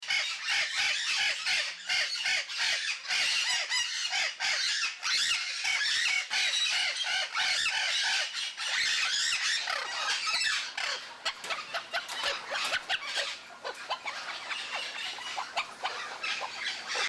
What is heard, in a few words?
Parrots feeding; Birds